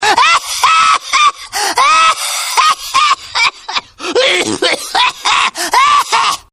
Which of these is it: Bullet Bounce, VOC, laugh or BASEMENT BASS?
laugh